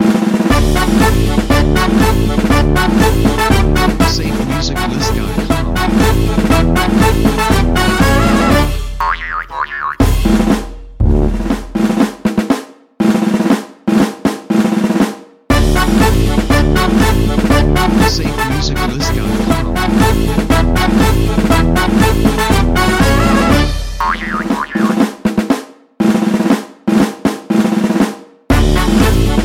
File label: Cartoon Marching Band Intros; SafeMusicList